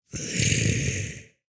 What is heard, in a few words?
Skeleton Archer Attack Vocals